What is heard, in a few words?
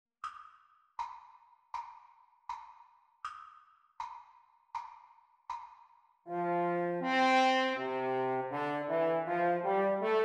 French Horn Duets Playalong Practice; practise speeds